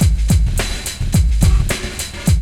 LOOP; E-Lab; X Static Goldmine 2; akai

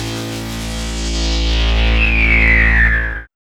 SYN SWP

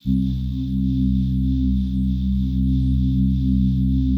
PAD, ATMO PADS; Best Service; XX Large

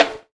CONGA; MLOP DRUM; E-Lab; X Static Goldmine 2; akai

